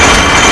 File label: m134 spin loop